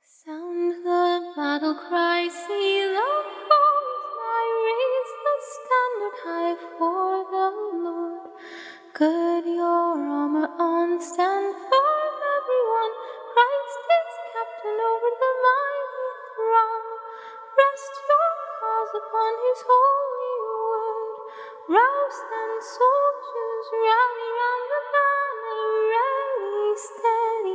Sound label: acapella hymns